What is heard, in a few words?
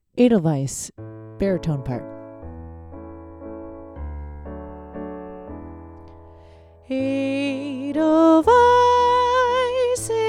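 bari, Practice Tracks; berwick-choir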